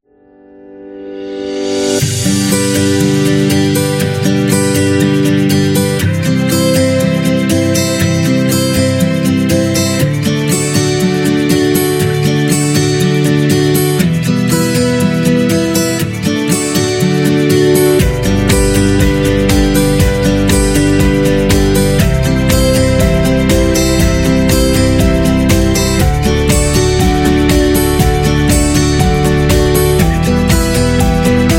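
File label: Food Industry, Upbeat and happy background music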